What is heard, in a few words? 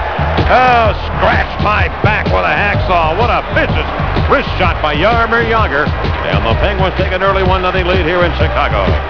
hacksaw